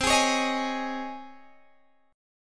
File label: C sharp Major 7th Suspended 2nd C